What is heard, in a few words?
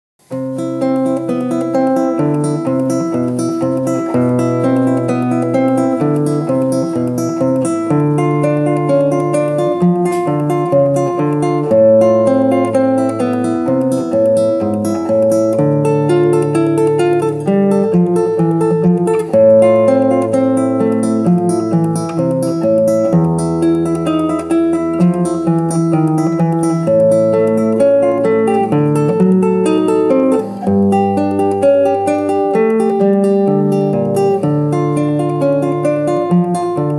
Etude-arpeggio